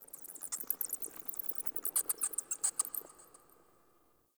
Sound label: Insect Night; Ambience; Warframe; Eidolon Plains